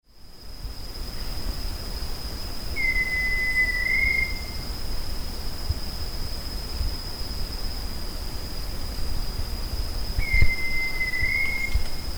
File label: Crypturellus soui Tururim